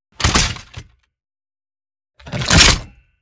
machinegun reload; Shattered Horizon

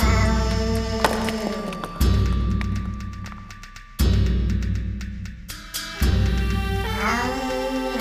suspens rythm vox; mountain world

